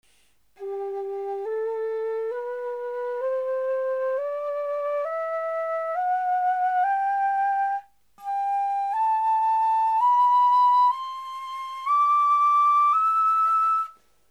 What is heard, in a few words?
quena